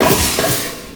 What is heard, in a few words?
chassis; WhiteOut